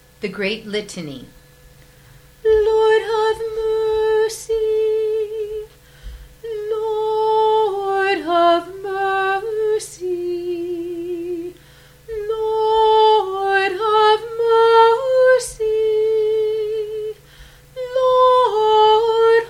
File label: great litany soprano